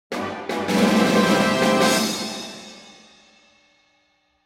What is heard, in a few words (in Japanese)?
お披露目ファンファーレ